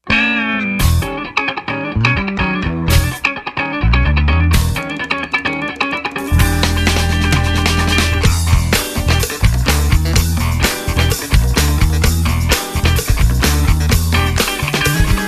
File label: Funky